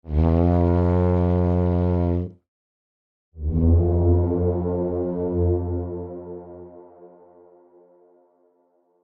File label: TRUMPET MOUTH PIECE THRU TUBE AIR HORN